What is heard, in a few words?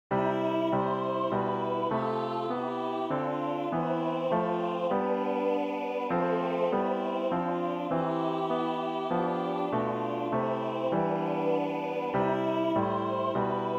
folk tune; netherlands